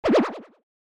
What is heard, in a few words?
Cartoon accent impact boing